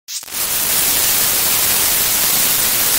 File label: very loud noise